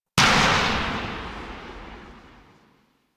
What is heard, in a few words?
Pistol Charged Tail; Weapons; Warframe; Drifter; Operators